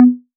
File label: warning; KitchenChaos